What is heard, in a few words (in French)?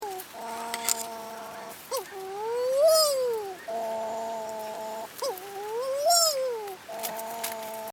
Puffin Pacifique; cousin island